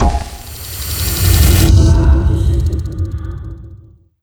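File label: spell poison cast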